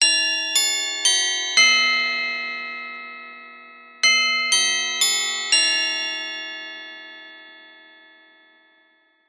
household doorbell old fashioned; zapsplat

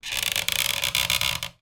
foley wood rail turn squeak; zapsplat

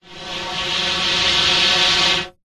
industrial plastic tube pipe flexi blow air into

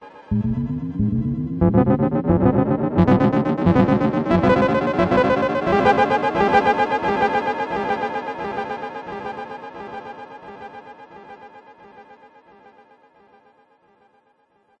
synth space power up slow oldschool; Jessey Drake

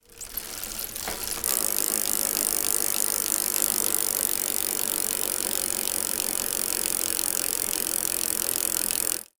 transport bicycle free wheel sproket spin

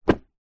household book thick paperback set down; zapsplat

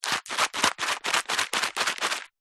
food sunflower seeds pack shake

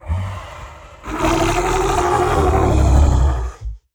Monsters Monster Roar Growl; Screams Shouts 2; soundbits

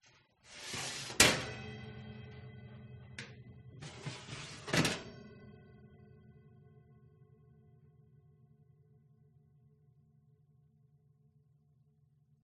baking tray take from oven

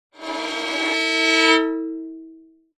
musical violin bowed scrape badly

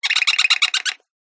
cartoon rub squeak clean; zapsplat

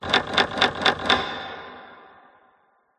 sound design transition clock tick into reverb; Skyclad Sound

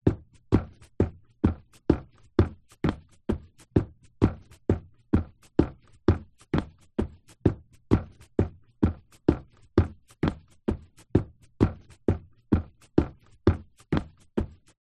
footsteps wood loose floor boots walking; foley; zapsplat

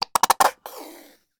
food mayonnaise bottle empty squirt; sound effects one

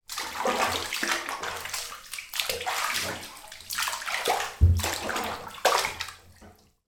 household bath person washing

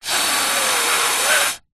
human snort nose through straw

